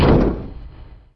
scrape, car; rally

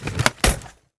9mm clip

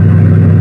engine; q3rally; camaro

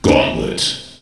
gauntlet; q3rally; feedback

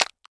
step; player; unknownworlds

